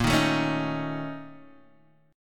A sharp Minor Major 7th Double Flat 5th, down, Guitar, Standard; strummed; JGuitar